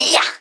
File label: Rogue Attack; Pecheneg